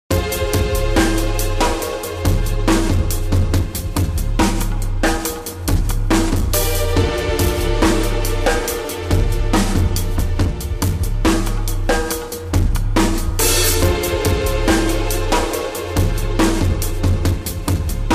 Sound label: funky